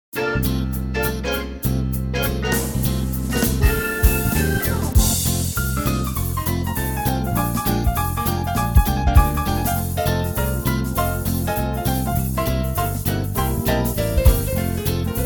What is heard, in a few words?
Bebop; AjamSonic